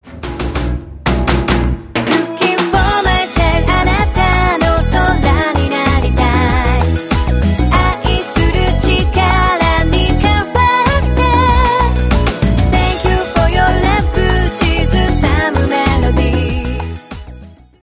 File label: song sample